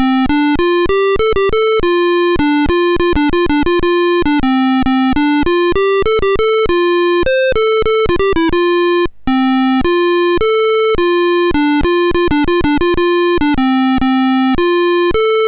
Reversi BASIC, MOS6581R3

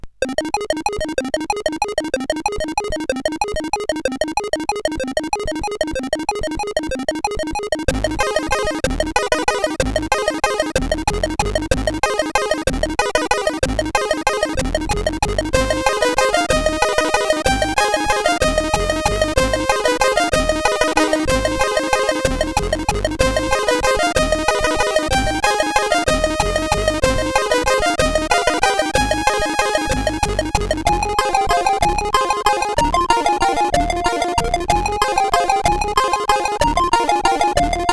Fairground, sid CSG8580R5

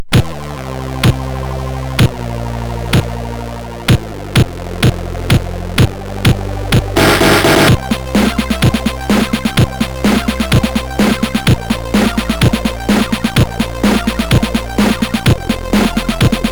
Digital Remix, sid MOS6581R2; Alien-Crackings; Disk

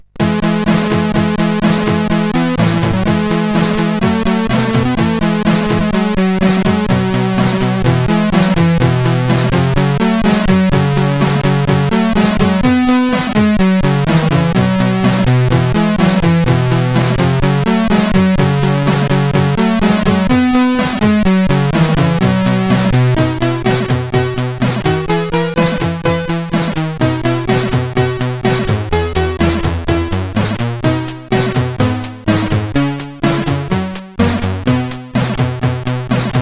X-Mas Dub, CSG8580R5

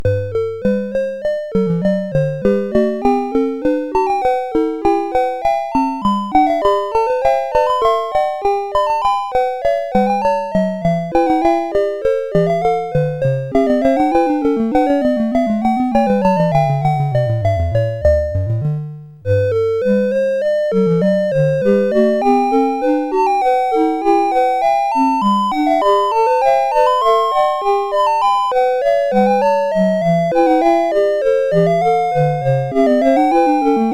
Goldberg Variations parts 8-13 sid CSG8580R5; hvsc

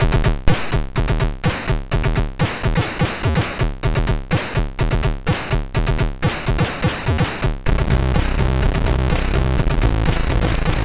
sid MOS6581R2; Dejecti0n Erecti0n

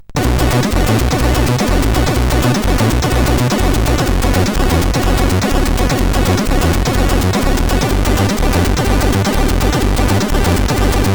Introtune, sid CSG8580R5; Strike Force

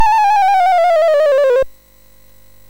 sid MOS6581R3; Donkey Kong 1983

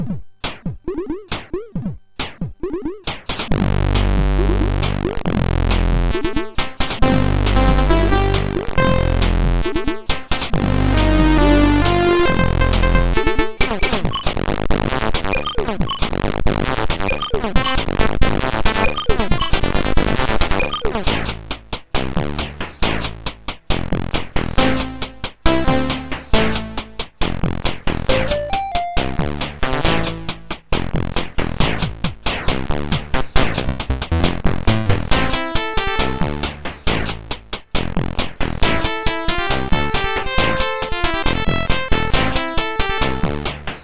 Sound Sample, sid MOS6581R4

